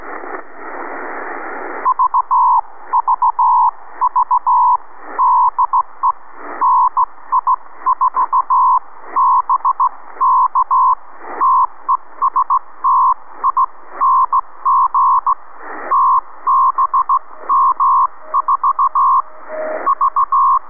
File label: TBM 4 Test Sequence